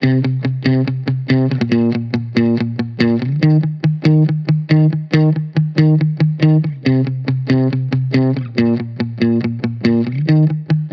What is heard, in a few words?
Guitar Rock 140 BPM Cmin, Guitar Loops; Ghosthack Advent Calendar 2021 - Day 10; Ghosthack Advent Calendar 2021 - Day 10 - Guitar Loops and One-Shots